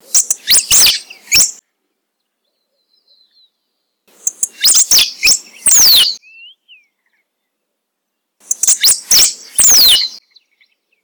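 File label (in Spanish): cotorra de bañado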